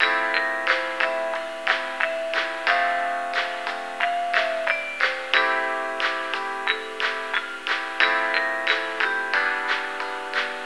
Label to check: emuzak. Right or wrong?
Right